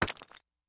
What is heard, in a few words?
Obstacle Bird Footprint; hello kitty friends match; android